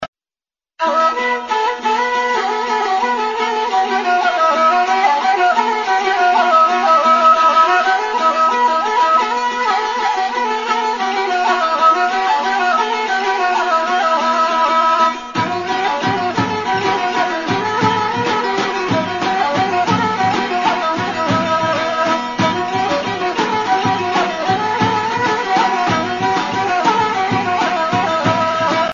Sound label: Sandansko Horo